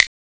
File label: PERC SNAP; SNAPS